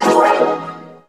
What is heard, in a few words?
Pickup Magic; SFX Kit; Pickups